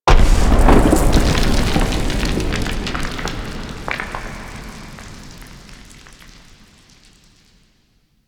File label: Mountain Pass Rock Dust Crumble; Ambience; Warframe; The War Within